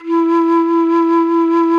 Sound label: FLUTE E3; Greytsounds; Scott Peers Sound Engineering